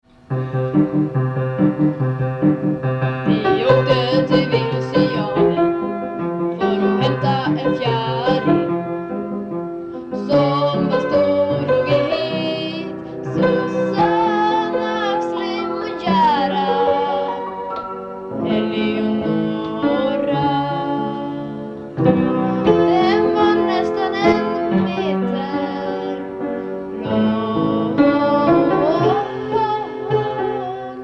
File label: piano